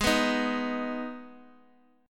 G sharp Minor G sharp down Guitar Open D, chord

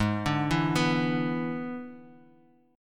E 7th Ab, guitar

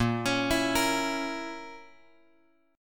A sharp Diminished, chord; A#dim Chord {6 X X 6 5 6} arpeggiated

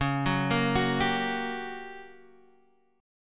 G Minor Major 7th Flat 5th, C sharp

